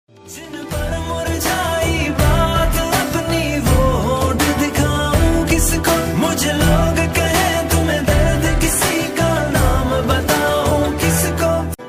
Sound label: Pakistani ringtone